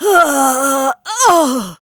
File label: Death, Barks; Kuva Lich; Persona B